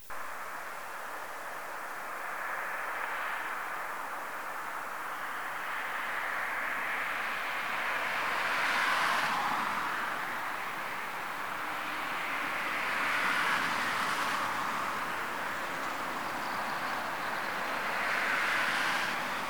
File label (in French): Batraciens Camions